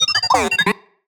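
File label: Sci-Fi Droid Robot Emote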